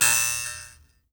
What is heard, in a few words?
MBIRA B 2; BS MBIRA2; Heart of Africa